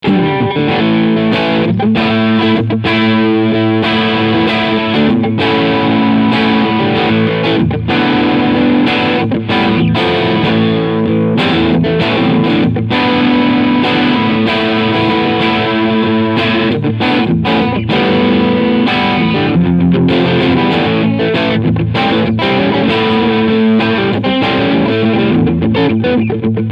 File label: crunch middle